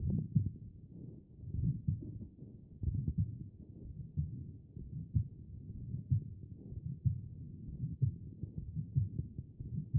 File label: HEART; B MODE